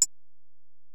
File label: EPerc, Alesis DM-PRO